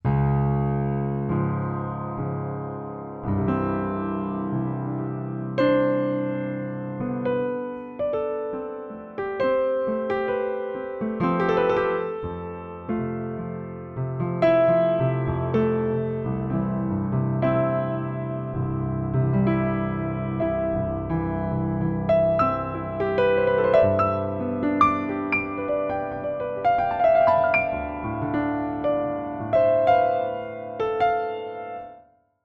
improv bass to treb

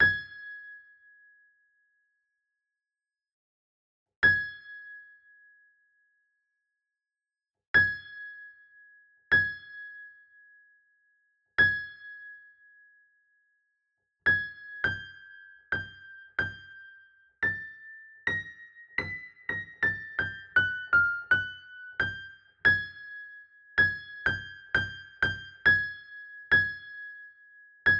Ab5 note rattle Steinway; Prelude D

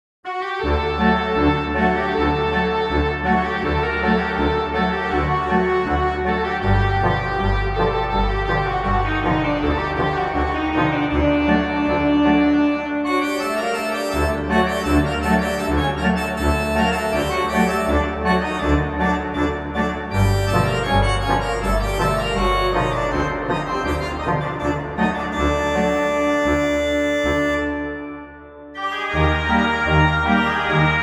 Folk tune Egyptian

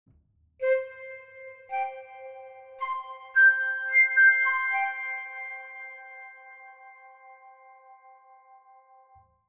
Flute Bechstein 1899 FX; Kremsegg2

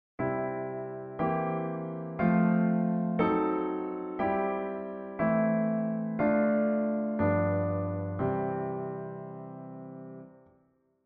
Shigeru Kawai SK-EX

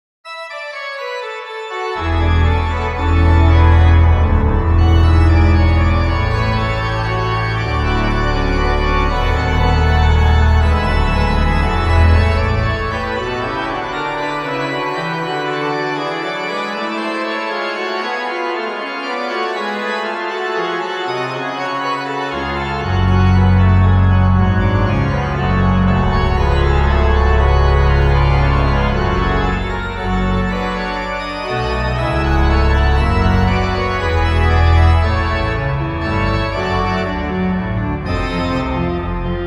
Organo pleno; Praeludium J S Bach; Pianoteq; Organteq

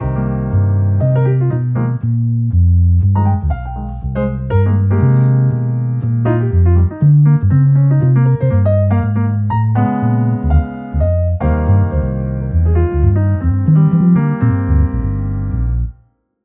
upright bass from guitar

